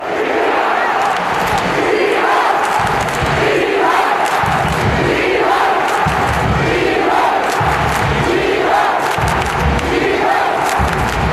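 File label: chivas, football chants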